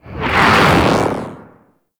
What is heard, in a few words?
Air Bombard Projectile Whoosh; Warframe; Venus